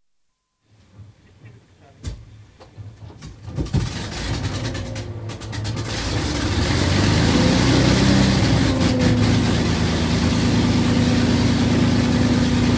Avro Lancaster navigator's position, engines start up (World War II bomber, 4 piston engines); Airplane Sound Effects; BBC